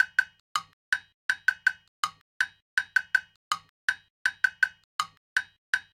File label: jam block studio sample; percussion loops; resample